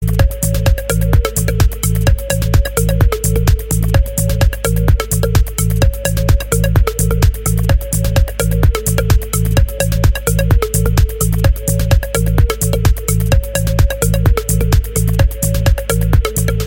Arabian

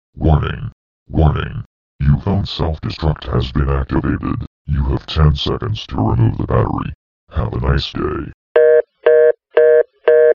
Self Destruct

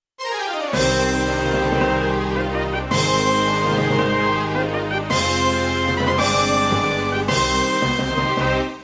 Game Over; Kq6

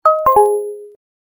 Discreet Notify

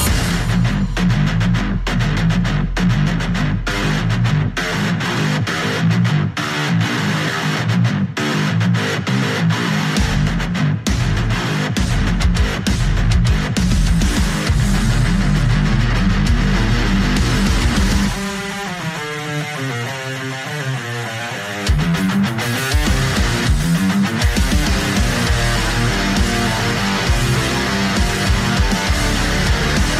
Bells

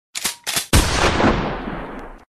Shotgun

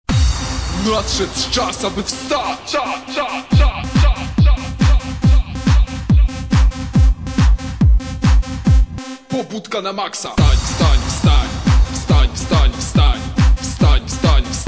Dance Budzik

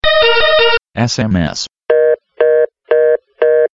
Sms Quick Ringtone

